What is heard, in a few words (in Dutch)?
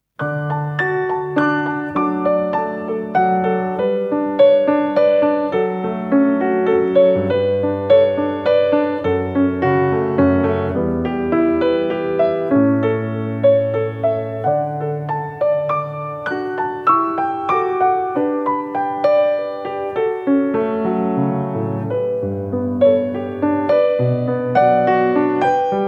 Wiegelied karaoke